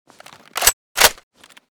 vssk unjam, weapons; Mask's Reanimated Anomaly Arsenal

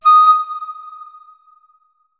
flute notes; Hideout Furniture

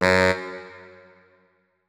notes, saxophone; Hideout Furniture

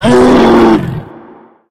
hit, psysucker, monsters; Soundscape Overhaul

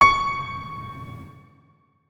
notes, keyboard piano; Hideout Furniture

